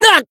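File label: damaged, Japanese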